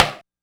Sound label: Stick; K-100 R KIT